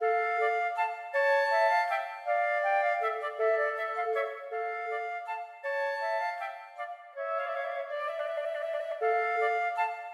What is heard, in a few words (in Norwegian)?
Menuet for fløyte duett; Aamodt